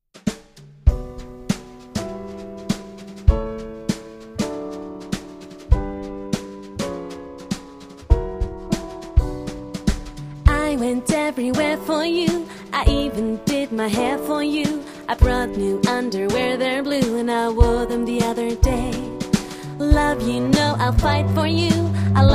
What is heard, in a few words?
Pop Jazz